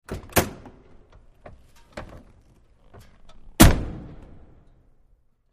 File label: Lincoln Towncar Door